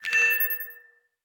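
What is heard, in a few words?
objective complete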